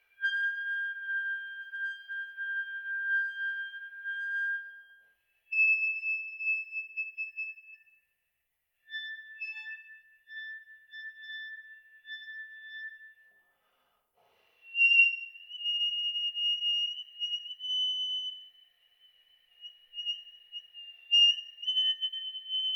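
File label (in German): bar, Zahntöne, Gehaltene Töne leise, Glissandi; Spiel mit Klappen, dann gemischt mit Stimme, Flatterzunge und Doppelzunge. Bariton; saxophon; spieltechniken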